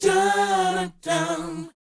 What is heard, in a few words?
BOYZ C#, Vocal Planet, R&B Dance; Spectrasonics Vocal Planet